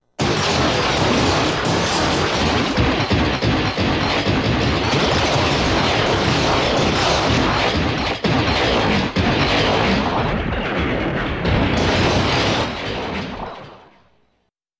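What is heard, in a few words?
Smashes Crashes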